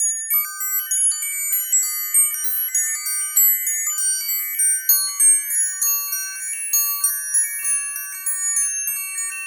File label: ding; svencoop; rustworld